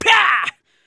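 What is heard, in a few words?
human middle male attack, voice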